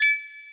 Coin; Idle Champions of the Forgotten Realms

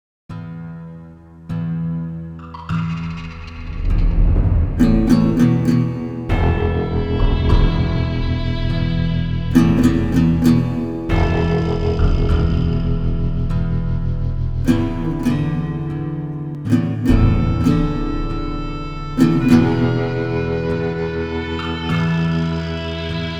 Load; Call of Juarez: Gunslinger; Borderland

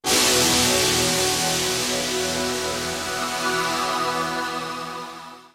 extra ball; zenith; CD-i

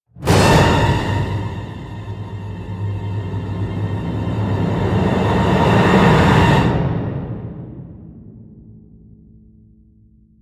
Pipe Broken; RTC; Remember Me

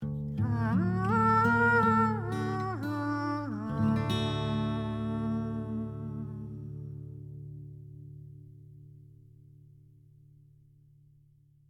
End Stinger; The Message

